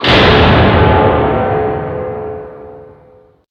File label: gong